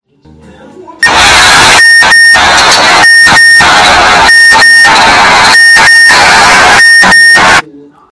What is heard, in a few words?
Mazda loud chime